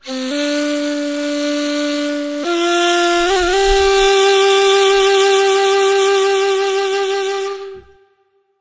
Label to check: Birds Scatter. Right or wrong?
Wrong